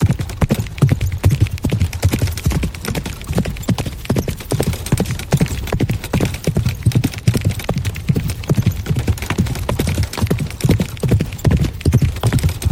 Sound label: galop, horse